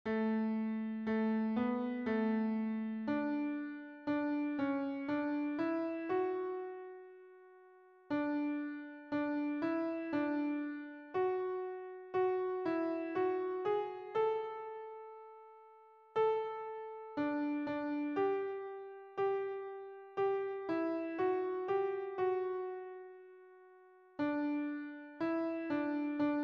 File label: Hymn Benediction; childrens Hymnal